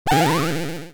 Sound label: Retro Video Game Sfx Spring